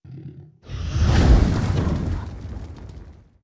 Dragon Roars Breaths

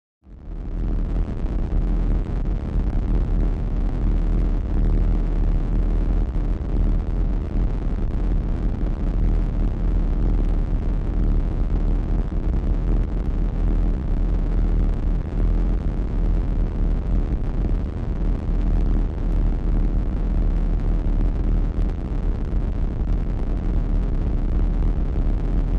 Distortion Distorted Drone with LFE, Static

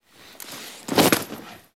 Jacket Being Ripped, cloth mvmt

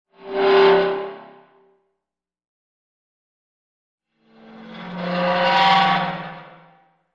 Dry Ice Eerie Short, dry ice

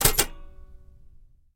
Typewriter Shift Ke

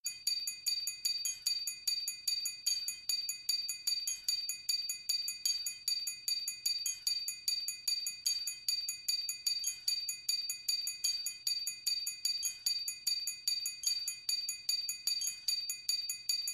Chiming Robot Works

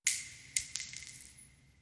Shell Case Sngle Revb, shell casing movement

